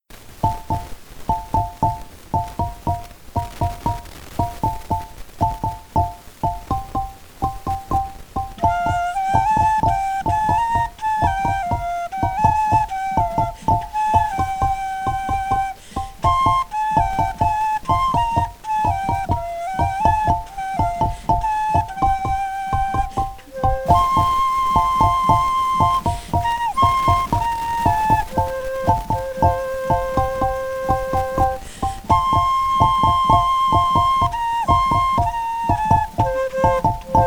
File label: flute & xylophone